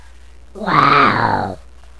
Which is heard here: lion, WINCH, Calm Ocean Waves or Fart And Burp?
lion